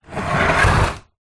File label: household vacuum cleaner compact slide tiled floor